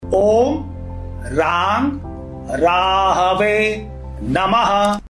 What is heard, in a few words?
mantra audio; mantras